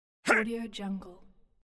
male attack grunt; medusamusiclab

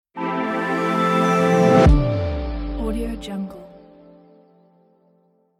simple logo

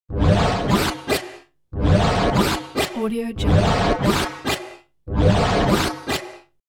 steam piston weird

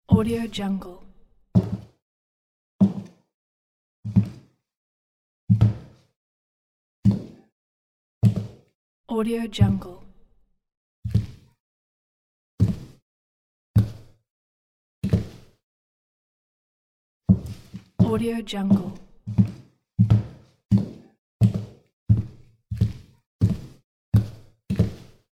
wooden floor footsteps pack

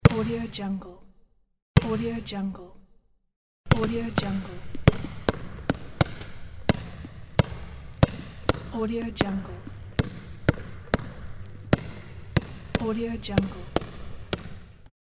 basketball bounce; medusamusiclab

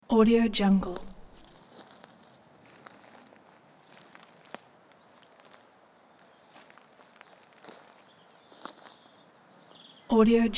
british countryside walking in woods

